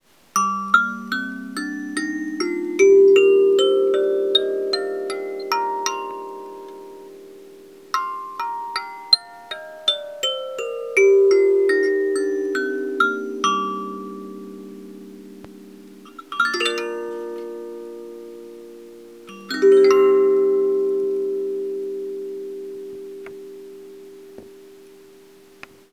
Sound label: 15 note kalimba instrument kalimbas mbira; Avalon